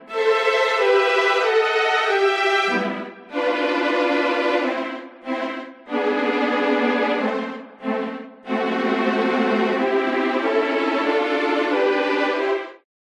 Strings Tremolo Strings; Synthphonica; Syntheway

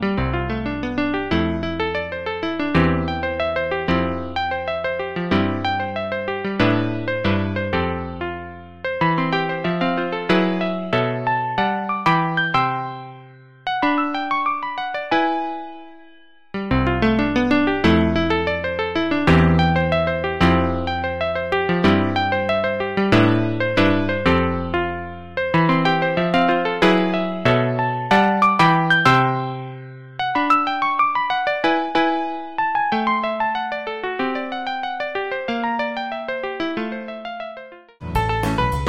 Yamaha CP 70 Electric Grand; ElectriKeys; Syntheway

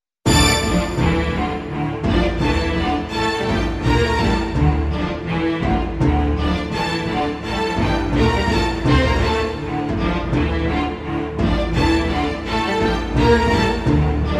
Choir, Strings; Magnus Choir; Syntheway

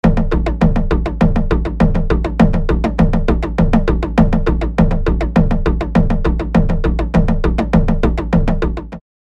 Indian Naqqara Nagara; Drumwavy; Syntheway

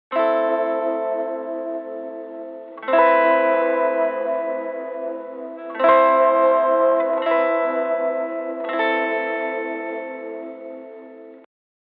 Echo Panning Dry; Echo Chamber; Cosmos; Echo Chamber is a stereo reverb and delay plug-in; Syntheway

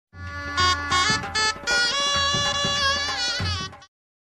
sehnai

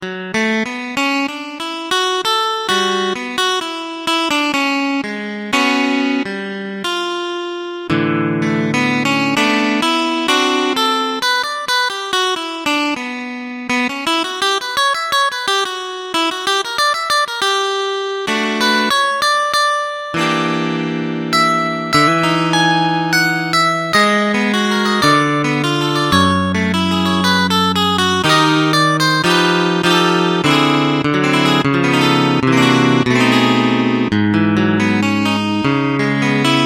Semi Acoustic Electric; Guitar; GuitarTempus; Syntheway

